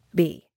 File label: bee